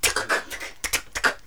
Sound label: NOISE, CLASSIC VOX; Best Service; Hallelujah